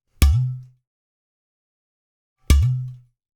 percussion loop

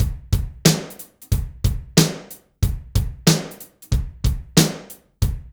DRUMS, Funky Ass Loops

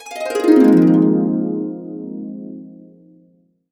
AB, Harps; USB Soundscan vol.62; AKAI

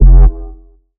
Bass F, Bass Synth

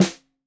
Snare 65 Reg Stereo, Deeply Sampled Drums

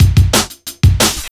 Drum Loops, 180; M8; Electronisounds

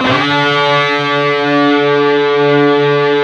PRS, Guitars, PWR SLID

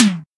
TOM, Eurotech; Sounds Good

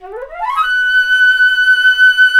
FLT FLT FX, C Flute FX, C Flt Gliss; Roland LCDP04 Orchestral Winds; 90 s Sample CDs

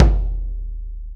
Concert Bass Drum; Planet Earth; Digital Sound Factory Planet Earth

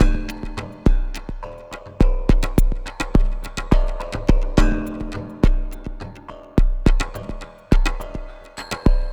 Terrestrial Hybrid, Processed Percussion

